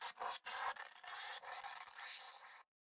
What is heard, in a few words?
static; Titanfall 2